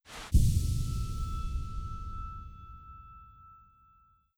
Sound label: Bench Rest; Hollow Knight